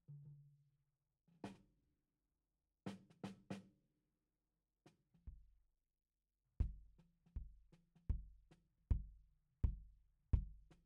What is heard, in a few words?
Kick In